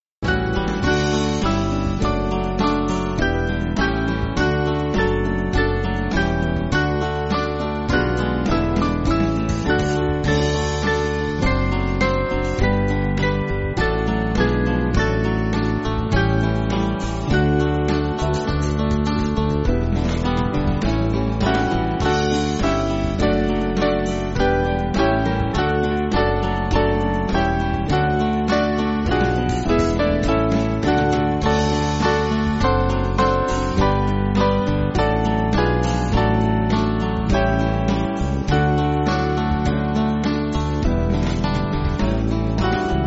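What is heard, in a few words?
Band, Music Backing Files for Churches; By Christ Redeemed; In Memorium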